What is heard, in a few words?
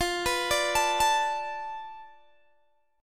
F 6th Flat 5th F Guitar Open G